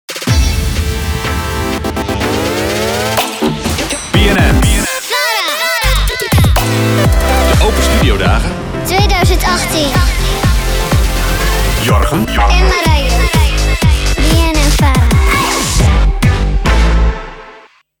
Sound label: jingle; Jingle van Open Studio Dagen